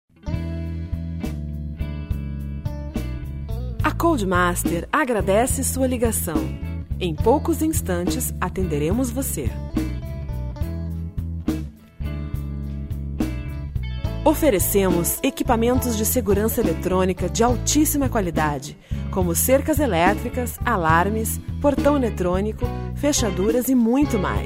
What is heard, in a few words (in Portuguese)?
espera telefonica; Criativa Produtora